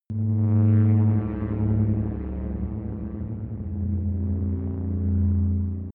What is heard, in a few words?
space background noise tonal; weltraum